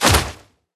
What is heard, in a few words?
Tower trap Catch; Deep Devils